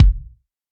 dope kick one shot a sharp key, kick drum single hit tuned to the A# note